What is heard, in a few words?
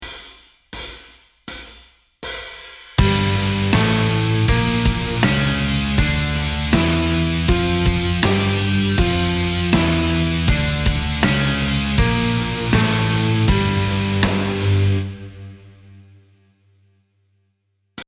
Exotic Modes G Dorian 4 Relative F Major; metal music theory